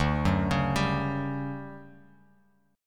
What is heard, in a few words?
D Major 11th D; arpeggiated; JGuitar